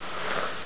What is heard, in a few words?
slide; enubis